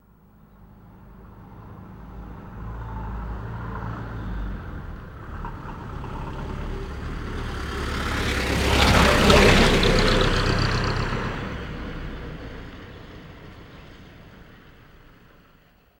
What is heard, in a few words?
English Taxi Drives